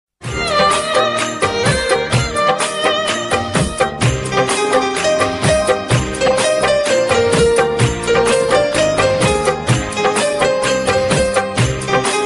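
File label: sad piano instrumental ringtone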